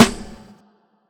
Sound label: snare